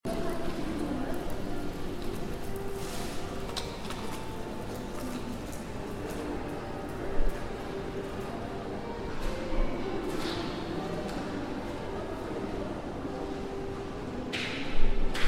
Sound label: light crowd